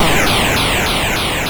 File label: sid MOS6581R2